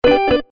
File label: Elastic Email, Organ; HTC